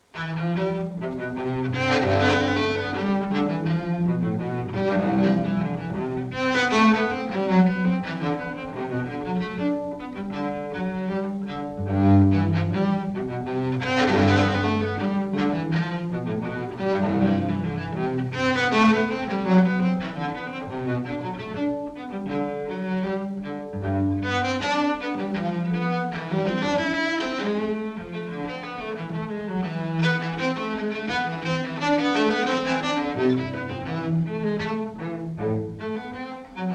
Suites for Solo Cello NO.3 in C Major V. Menuet I Live; Bach Suites for Solo Cello, Bvw 1007-1012 (Live)